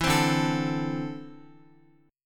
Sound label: D 7th Suspended 2nd, D, down, Guitar; 4F,4A,5C,5G,5C,6E; strummed; JGuitar